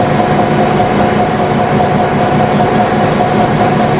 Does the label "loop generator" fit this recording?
Yes